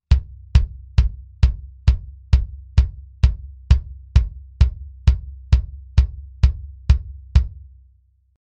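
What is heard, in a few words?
bass drum Mic Test M201TG solo dry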